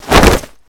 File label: prepare cloak; rayfa